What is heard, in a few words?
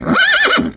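Run, horse; Epona running when cropped (same sound in 4 pitches)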